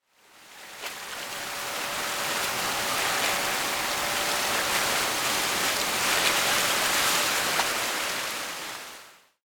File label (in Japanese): 荒波 フェードアウト